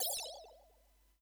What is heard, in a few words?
laser; mmrnmhrm